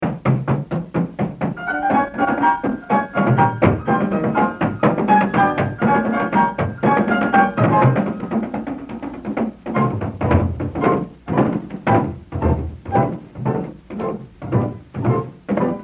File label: Tom Solo; Etude 1A; Baby Dodds; The Evolution of Jazz Drumming (Gottlieb)